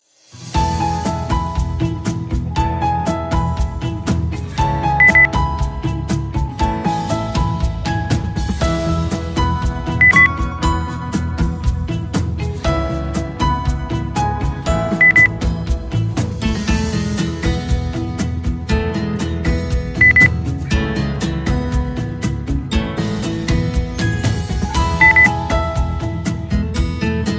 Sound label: pop